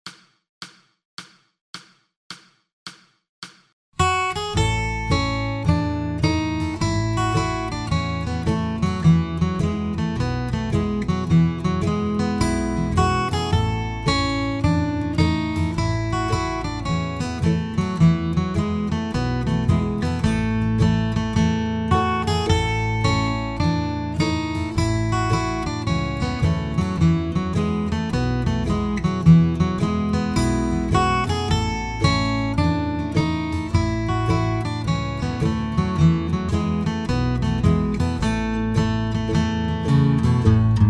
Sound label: Slow Guitar